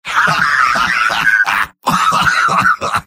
laugh long; engineer; video games; source engine; tf; mvm

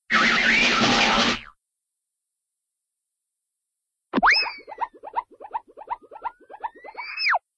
spins; cartoon; hannah barbera cartoon sound fx

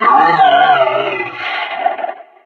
taunt yeti standee speaker growl broken; video games; source engine; tf

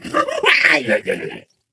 ballbot jump spin

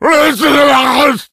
rage at victim, infected, male; npc; Left 4 Dead 2; Source Engine

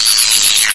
pain, antlion; Source Engine; video game; npc

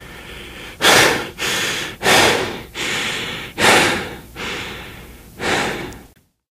exertion minor, biker; voice

